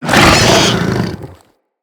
Infested Ancient Attack Grunt; Enemies

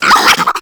Infested Biped Flip; Warframe

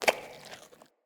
Camel Idle Chew; Khaban Camel Vendor; Vocalizations

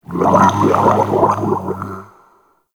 Inked Humanoid Large Attack; Enemies; Warframe; Shadowgrapher

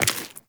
Step Flesh, Footsteps, Walk; Warframe; Tenno

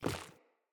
Sandals Land Rock, Footsteps; Player

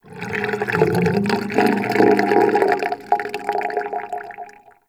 Galleon Pipe Gurgle Amb; Ambience; Warframe; Grineer Galleon

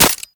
Mech, Weapons; Lato Remaster; Warframe

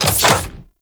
Gas City Small Door Open; Corpus

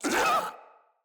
Infested Charger Pain

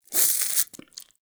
Wheeze Close; Ambience; Warframe; Infested Eris

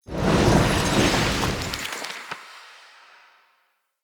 Movement Whoosh; Characters; Enemy; Mendicant; MndWazard

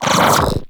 Pull Cast; Warframe; Mag

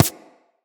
Laser Impact Generic

